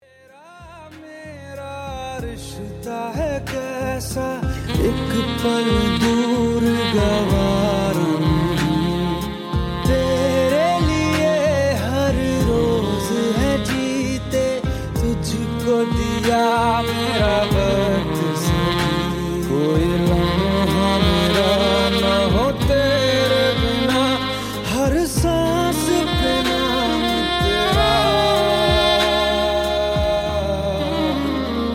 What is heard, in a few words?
sax, solo saxophonist